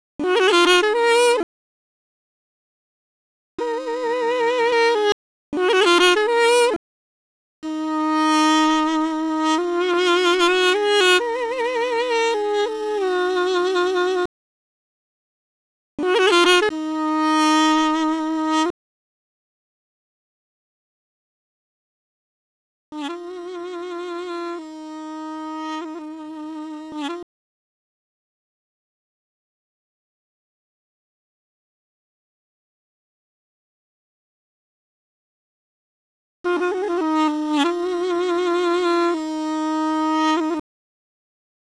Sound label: Duduk; 90bpm; Demo Multitracks